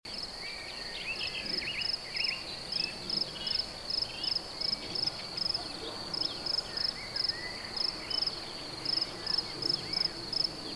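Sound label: Countryside early spring night ambience loop; UltimateFishing2020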